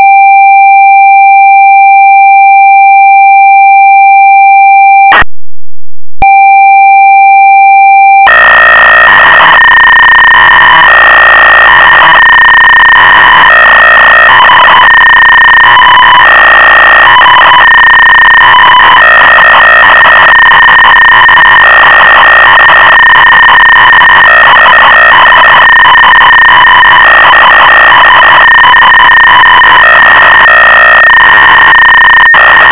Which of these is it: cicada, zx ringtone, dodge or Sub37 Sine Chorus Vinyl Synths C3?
zx ringtone